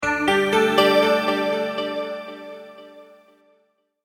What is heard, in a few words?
multimedia logo, Musical Identity/Signature; Sonic Logos; Sound Design; Short Musical Signature / Ident